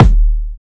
wet kickdrum sample D# key, Royality free kick one shot tuned to the D# note